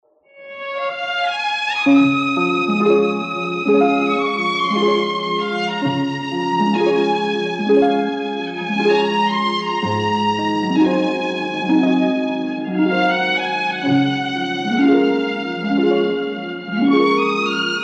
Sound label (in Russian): Skripka